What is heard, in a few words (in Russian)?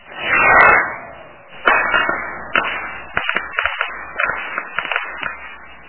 feiverk shumnii zapusk roketi hrustyashii